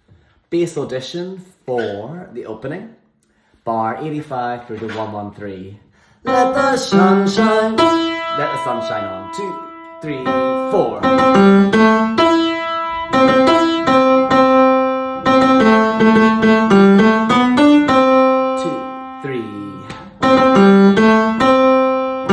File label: Bass Audition Opening; Disney's Frozen; auditions